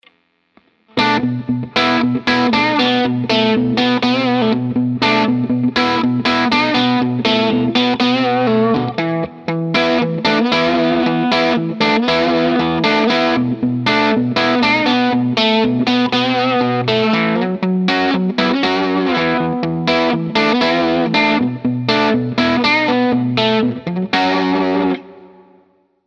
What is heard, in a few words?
el84 chord thing click, guitar